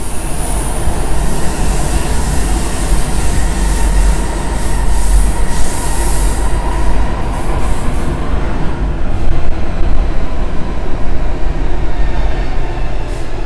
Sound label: train